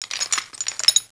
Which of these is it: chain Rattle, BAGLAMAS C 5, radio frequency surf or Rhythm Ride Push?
chain Rattle